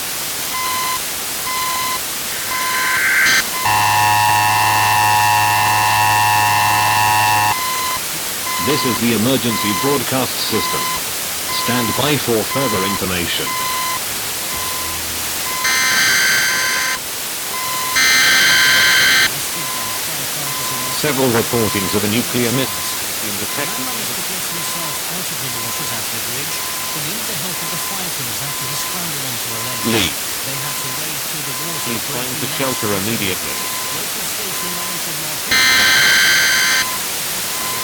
Emergency alert system distorted fm noise

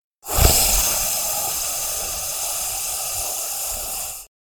Jetpack

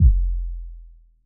Rusty Box 808 Style Kick

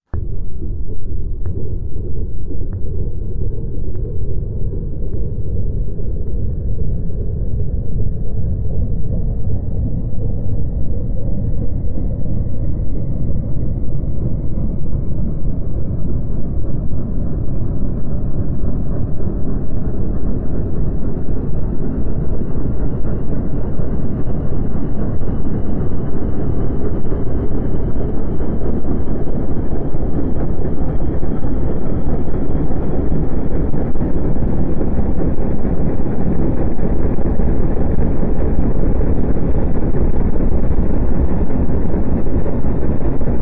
Thing Rocket

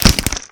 Deathcrunch Gore Blood And Bone

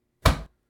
Punch Kick Being Blocked

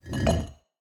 Blink Hard

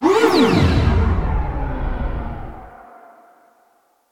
Ghost Swoosh

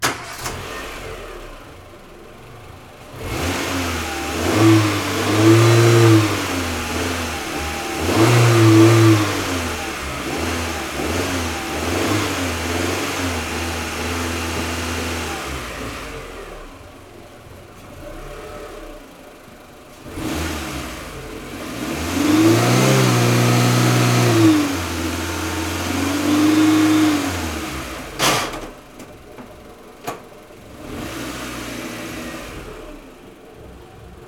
Old Car Drive Off

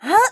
Effort noise

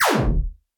Laser

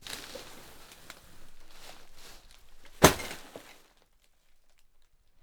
Litter Throw Bag Metall Film

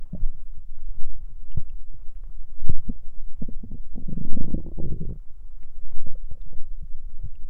Stomach growling

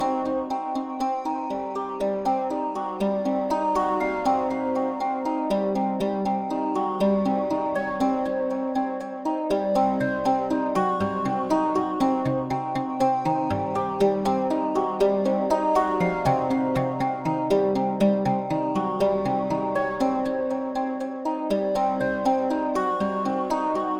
Hypnotic Loop

